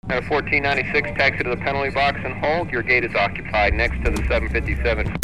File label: flight